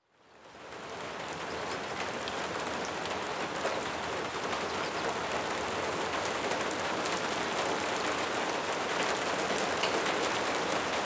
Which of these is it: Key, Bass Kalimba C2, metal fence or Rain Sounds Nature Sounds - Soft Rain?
Rain Sounds Nature Sounds - Soft Rain